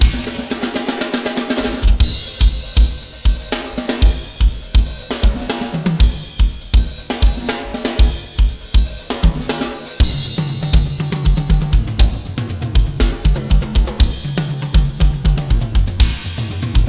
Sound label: big rock drums